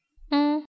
miau

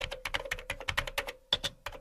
keyboard typing

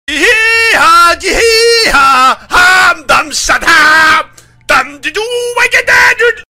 sound musique

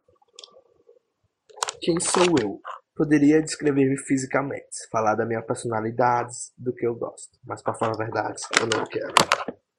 hehehe

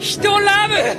ringtone

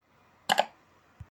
click; meme

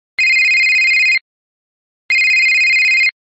phone ringing

